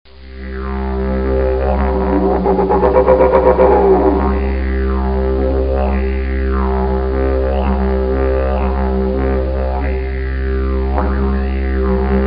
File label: didge